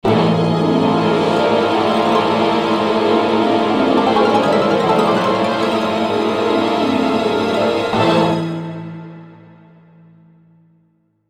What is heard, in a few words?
Orchestral Phrase; FXHome